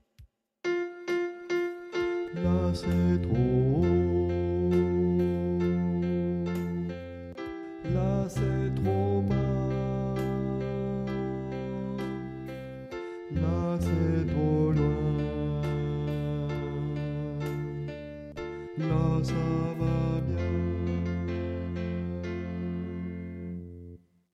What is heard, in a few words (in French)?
basse